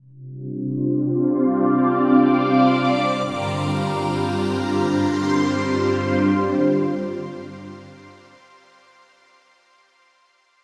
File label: NRG Pad